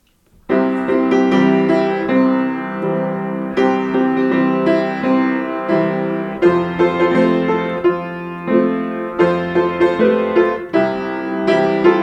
This Little Light Of Mine, piano; This Little Light of Mine, I'm going to let it shine

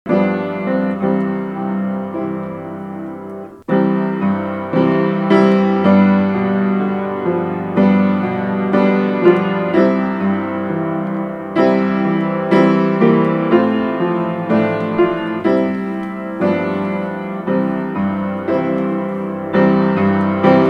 Piano Music; God is so good, God is so good, God is so good, he's so good to me.

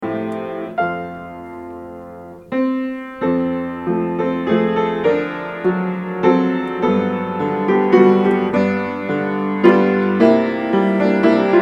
For God So Loved, piano; For God So Loved the World, He Gave His Only Son, To die on Calvary's tree

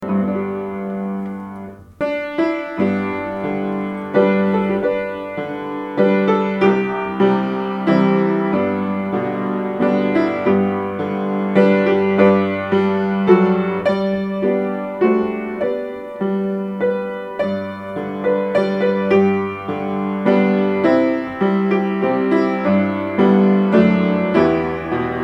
Amazing Grace, piano; Amazing Grace, How Sweet the Sound

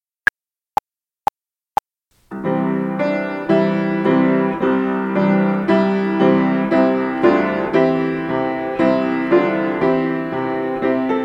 Watermelon, piano; Watermelon, Watermelon, God's Good News, God's Good News, Jesus died for sinners

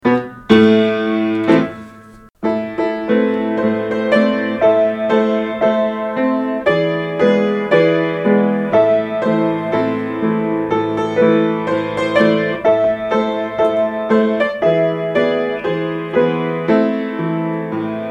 Joshua Fit The Battle Of Jericho, piano

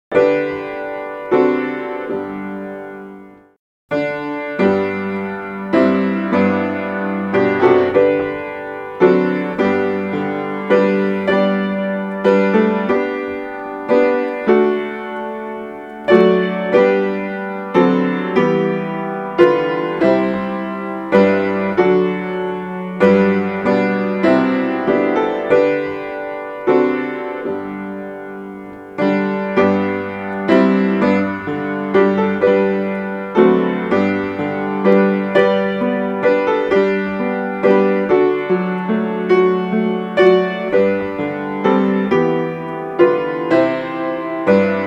Alas And Did My Savior, piano; Alas And Did My Saviour Bleed, and did my Sovereign die for me.

